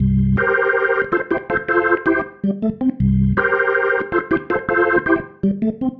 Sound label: Progression, Organ, Loops